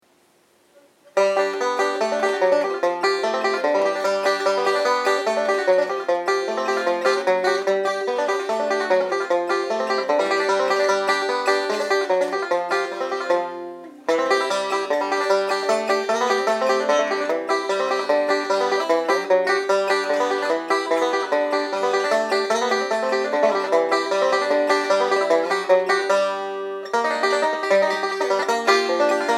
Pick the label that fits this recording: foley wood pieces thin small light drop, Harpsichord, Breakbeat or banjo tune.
banjo tune